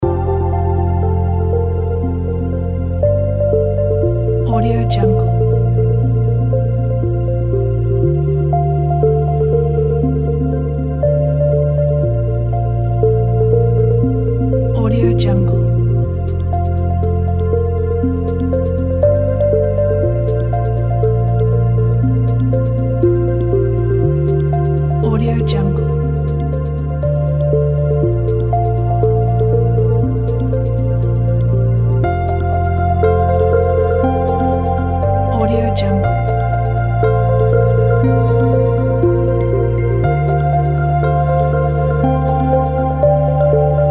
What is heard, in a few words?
ambient background calm inspiring loop